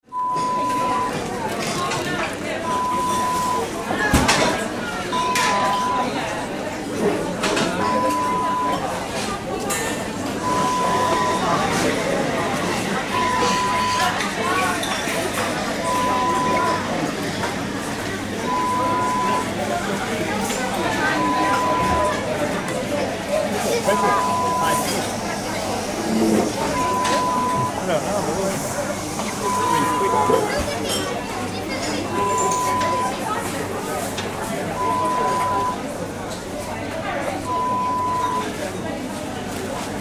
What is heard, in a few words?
Large cafe sound